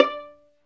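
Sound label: STR Violin Pizzicato, Solo Violin FX; Roland L-CD702; Solo Vln FX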